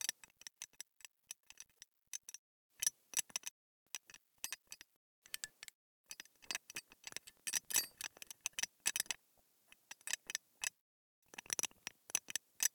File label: Helmet Jingle Loop; Friendly; Tuvalkane; Discovery